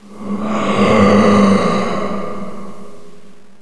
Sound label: ghoul idle; cstrike